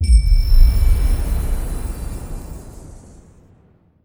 Painting Reveal; Journey

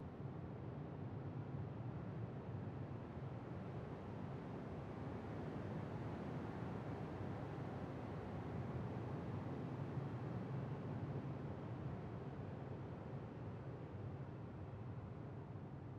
subtle wind loop; background

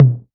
Tom 606 B2; One Shots; Drums

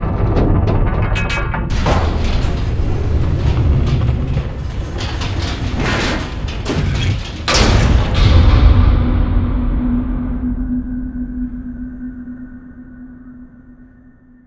Cave Ancestor Gate Open; Journey

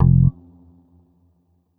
Bass Guitar Note F

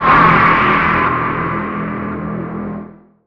Impact Soft Top; One Shots; FX; Ableton Live 12 Lite; Core Library

